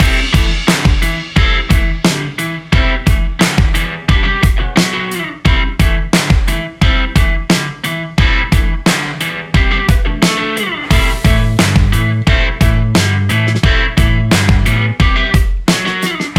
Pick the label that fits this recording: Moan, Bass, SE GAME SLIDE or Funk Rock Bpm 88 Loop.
Funk Rock Bpm 88 Loop